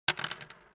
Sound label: foley office pencil drop; smartsoundfx